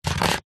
leisure game score slider move slide

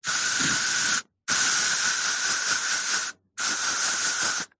household sunscreen aerosol spray; zapsplat

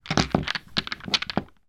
miniture snooker pool balls rack on table

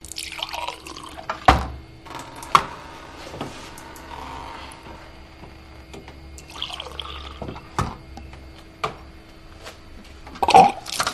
pub pump testing; field reports

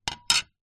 glass sheet small square set down on wood; foley; zapsplat

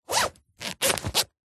foley luggage backpack zipper rough zip

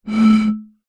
bottle beer empty blow air across top

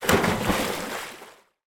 water splash; sound effects one

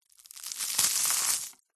food cereal wheat biscuit snap break; zapsplat

